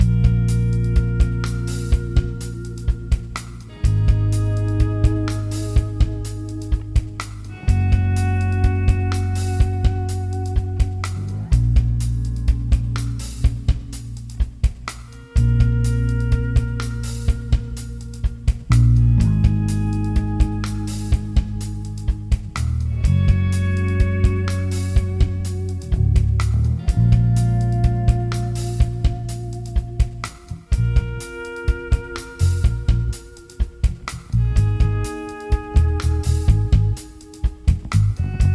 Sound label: solo loop